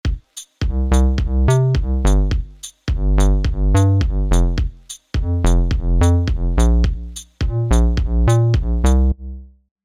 Bass Fast Attack

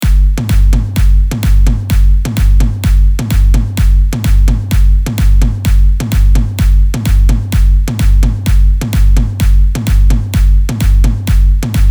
Big Room Drums

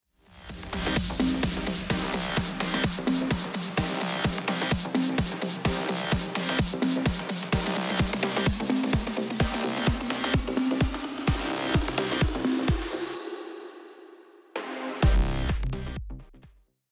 verb roll

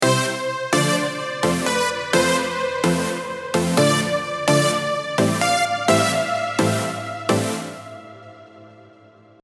dissonance non unison

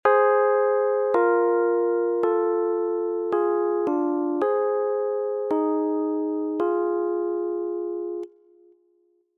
Chords real

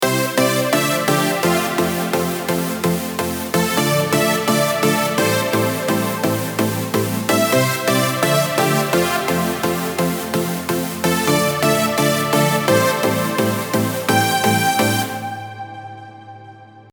weak notes

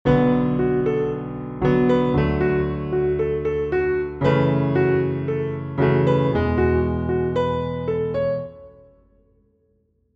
melody n chords

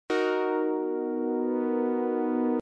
Chord Spectrum

